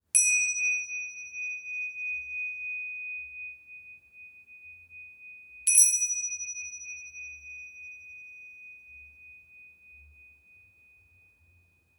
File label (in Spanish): Tingshas grandes de 7.5 cm